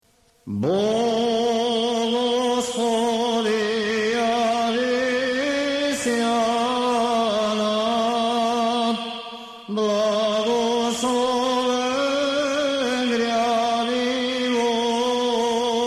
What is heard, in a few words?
osmoglasnik iv glas